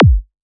Kick; LiLA'c Records PsyTrance Sounds 01

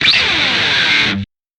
guitar FX; PsyTrance

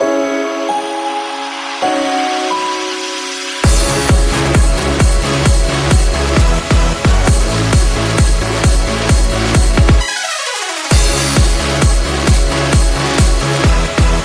instrumental

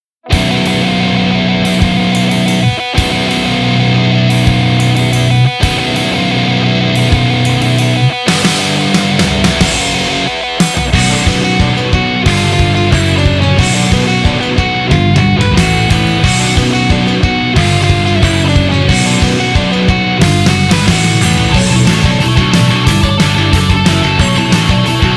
Off vocal

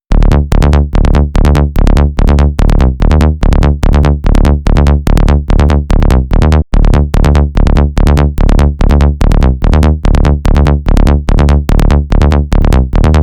Bass Fullon, PsyTrance; LiLA'c Records PsyTrance Sounds 01

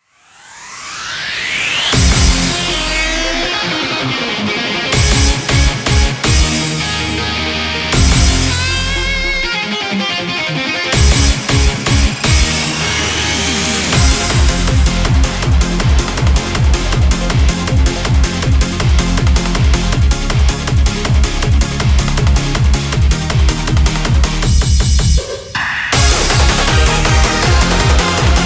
Instrumental

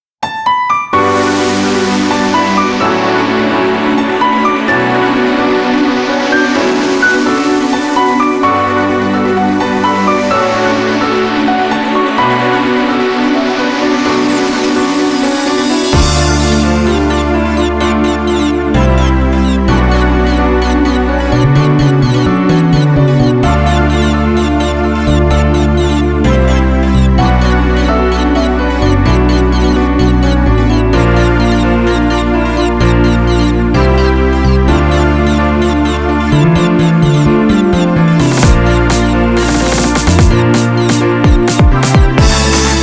instrumental

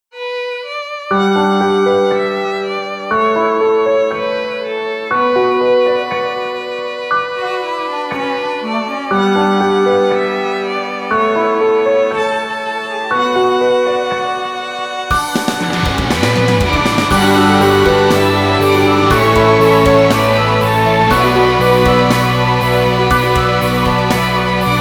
Instrumental

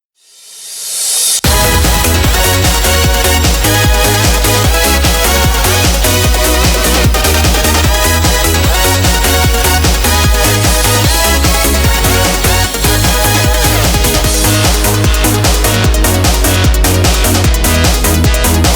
instrumental

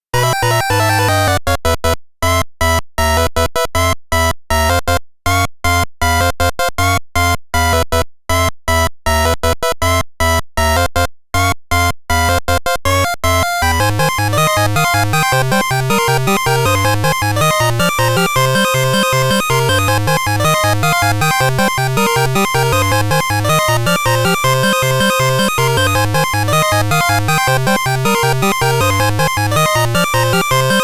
8bit

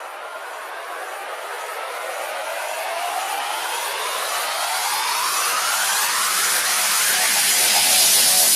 Short Uplifter

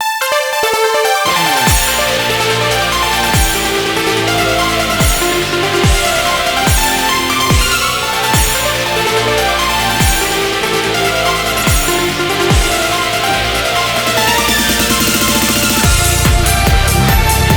instrumental

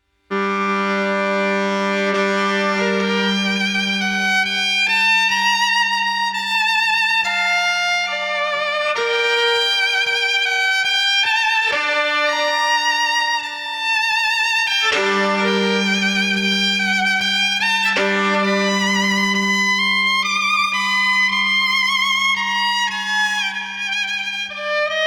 Violin Solo

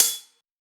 Closed HH, PsyTrance; Cymbal

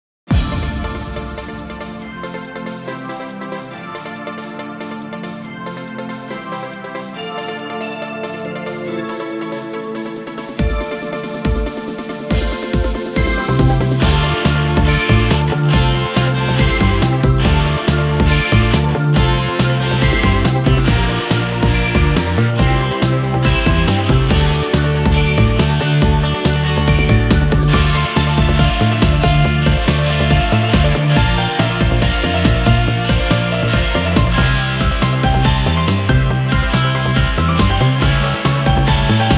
Karaoke ver

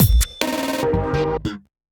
FILL, PsyTrance

